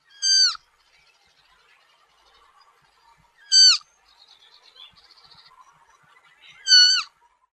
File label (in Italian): Capriolo Piccolo; Versi Animali; Il Forum della Fotografia Naturalistica